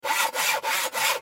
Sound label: Saw Cut Wood, Saw Cut Wood Industry Tool Loop; Cartoon